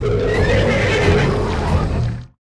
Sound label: Entity Strike; Sid Meier's Civilization 4 Complete; Beyond the Sword; Units